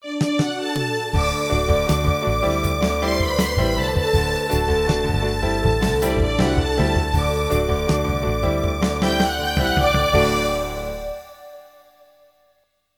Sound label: Jingle